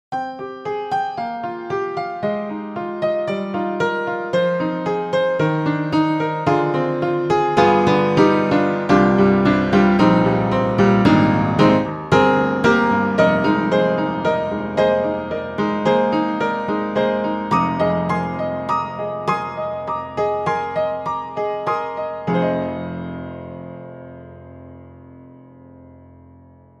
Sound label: Upright Pianos Vintage Upright Vintage 60's Rocker; Synthogy; Ivory II